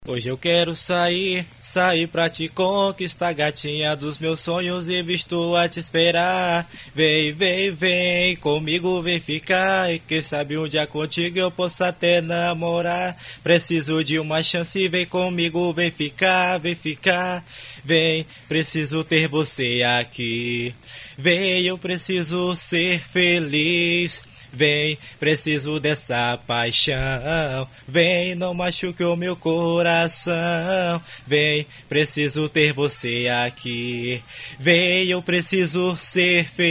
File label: acapella